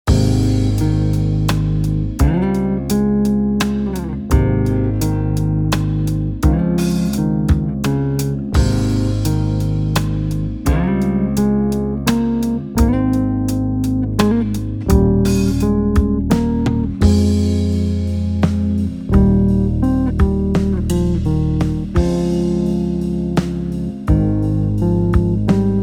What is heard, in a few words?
Strings